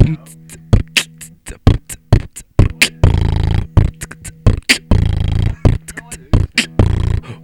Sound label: beatboxing